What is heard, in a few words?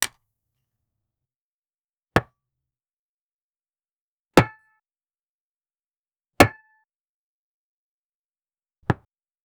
a short realistic sound, golf